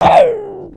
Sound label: Attack; Skullkin; creatures organic